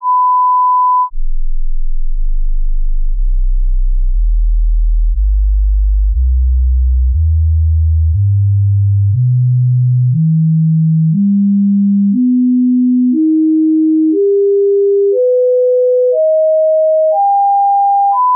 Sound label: Stepped sweep 1s per tone; CD Test Tones